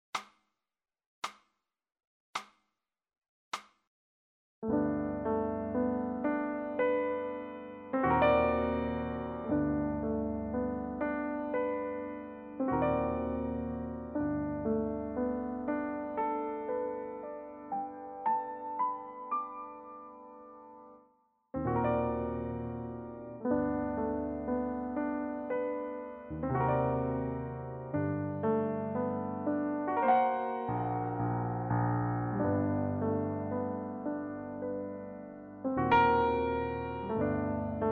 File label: piano, G